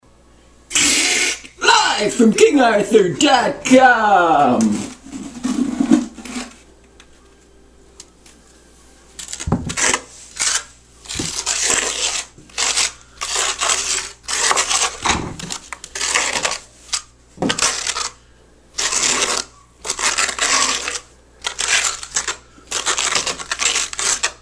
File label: Mud And Trowel Mix